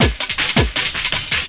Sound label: Loop, Drum & Bass, 160 BPM